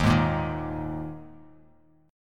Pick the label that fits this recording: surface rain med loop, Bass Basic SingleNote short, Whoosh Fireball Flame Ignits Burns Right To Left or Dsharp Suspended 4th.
Dsharp Suspended 4th